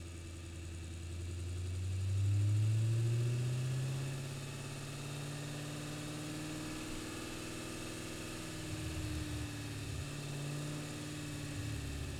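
Audi RS4 - ONBOARD - CIRCLES - Drive in Circles Stop - ENGINE - Center - Firewall; 2013; DPA4061; Pole Position Production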